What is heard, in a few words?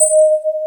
Electric Pianos; Wizoo Electric Pianos